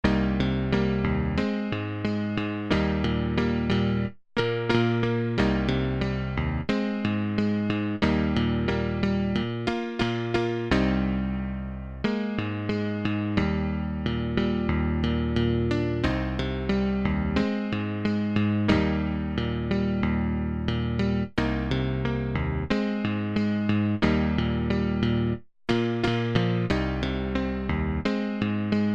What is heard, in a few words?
Emax CP70